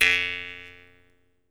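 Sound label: Jaw Harps, Pitched Percussion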